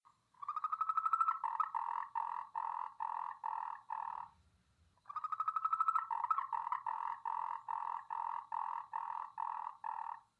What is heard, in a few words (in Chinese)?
莫氏樹蛙叫聲